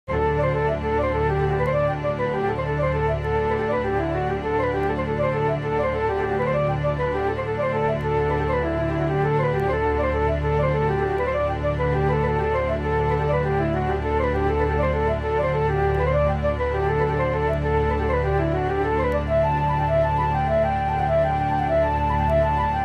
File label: green groves of erin flute